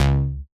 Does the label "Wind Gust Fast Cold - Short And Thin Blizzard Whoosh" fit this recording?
No